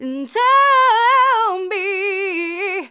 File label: Female vocals and robo-vox